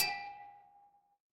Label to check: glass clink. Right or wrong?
Right